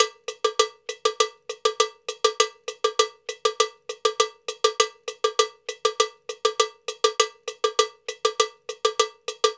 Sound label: Cow Bell, Latin Percussion